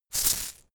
insect shaker hit double hard

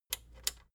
plastic button small latch click disable slow

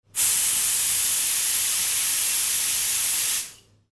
blower

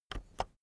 keyboard external enter press slow

